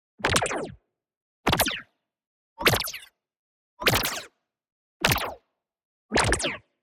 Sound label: ABILITY CHARGE Thin Laser; boom library; Matter